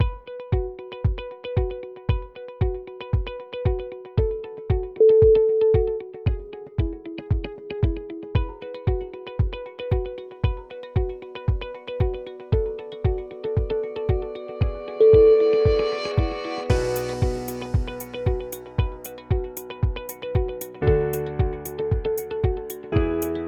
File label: corporate technology